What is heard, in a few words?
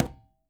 quinto; Sampleheads; NYC Percussionworks